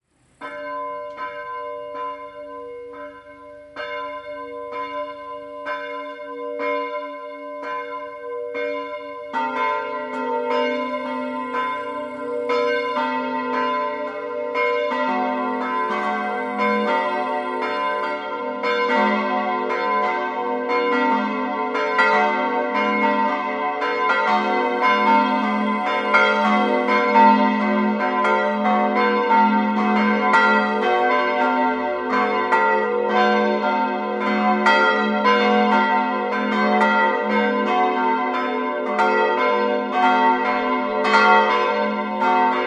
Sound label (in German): Altdorf, glocken